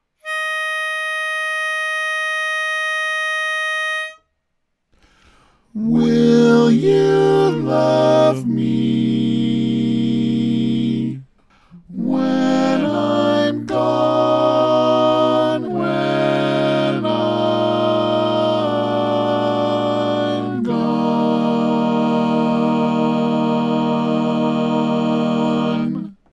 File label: Lead, barbershop